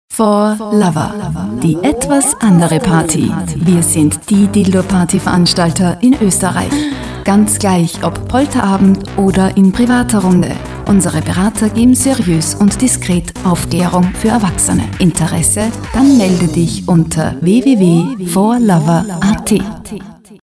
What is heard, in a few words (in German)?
musik laut